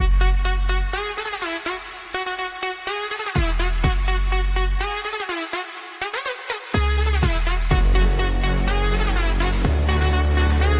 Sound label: Bgm Ringtone